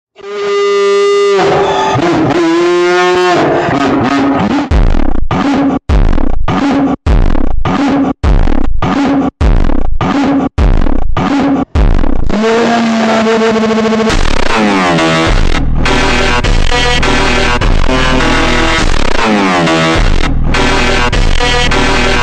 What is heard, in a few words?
korean funk ultra slowed reverb